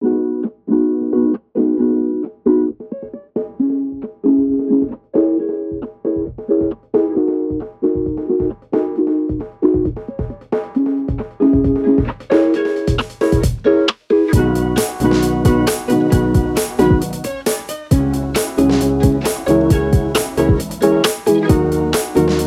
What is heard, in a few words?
Inspired Bossa Nova